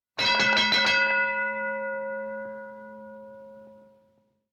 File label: ring bell